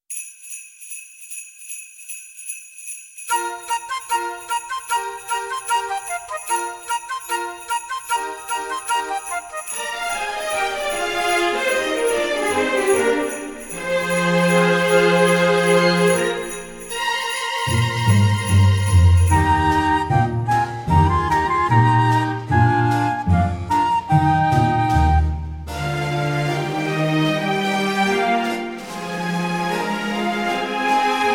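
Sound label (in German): kling glöckchen klingelingeling 3 Strophen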